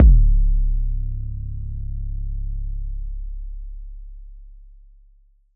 HAMMA SUB KD

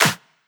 House Clap

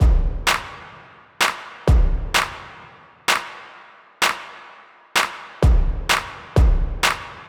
Intro Loop 128 C Wet

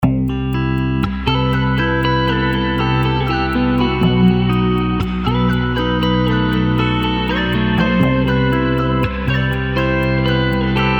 Live Guitar; Goosebumps

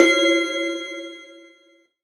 C Synth Hit

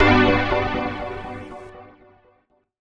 synth chord pad wavey B min

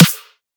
DNB Snare Whack

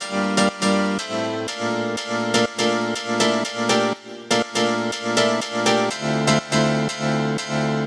Piano 122 G Wet

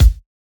Kick Shot

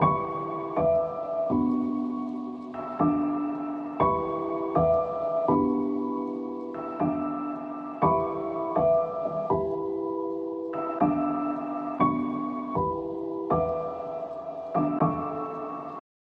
Piano 120 bpm

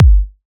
Kick

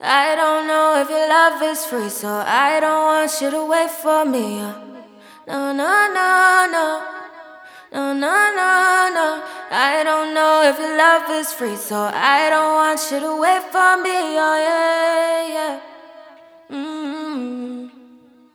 99 vocal loop know C-m

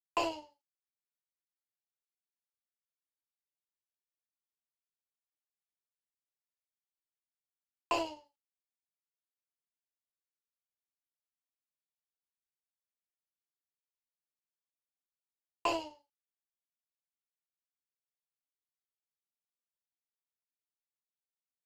Vocal Hit Cmin 124bpm

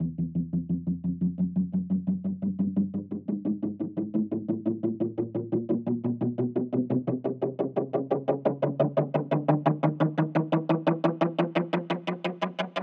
174 Synth Upper

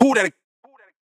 TAIKO vocal pure

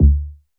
Syn Bass; Arpa

